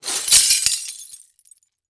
Impact Glass Debris Fall To Cement; Impacts

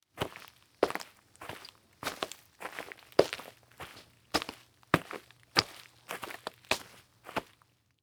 Foley Footstep Boots Walking On Leaves; Foley Footsteps

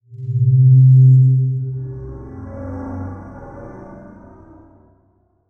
Title Transition Swell; Production Element

